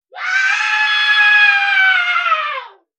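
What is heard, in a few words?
crazy person; sumasshed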